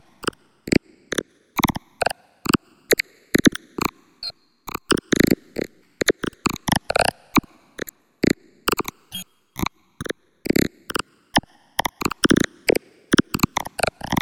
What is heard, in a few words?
scratch synth bleeping d minor 135; loops; tangerine